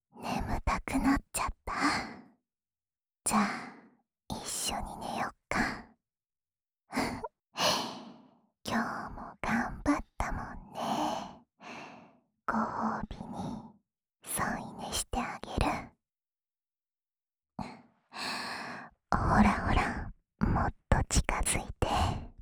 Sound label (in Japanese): 添い寝 左; 富豪の嫁選び～重要なのはやっぱりHの相性だよね～; プレミアムフォーリー